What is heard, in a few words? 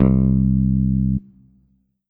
Korg NS5R Picked Bass C2